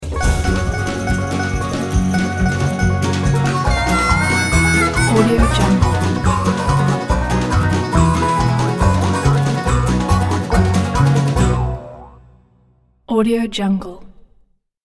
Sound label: bluegrass ident